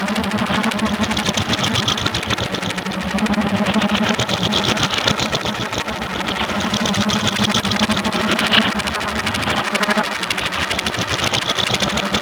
Sentient Search Drone Movement Loop; Warframe; Tau

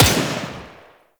Tommy Gun Shot; Tenno

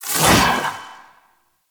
Doom Sword Whoosh E; Excalibur

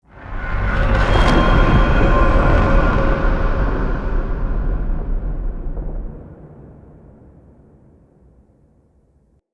Change Sky; Wazzard; Bromius; Wazard

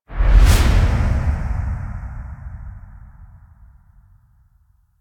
Zoom Whoosh; gameplay; Warframe; NineteenNinetyNine; Stage11FuturePast

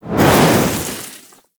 Look Whoosh; Gameplay; Ballas; Warframe; Chimera Prologue

